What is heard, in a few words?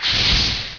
FIRE BELC